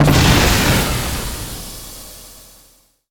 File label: phosphorus grenade sound; Deus Ex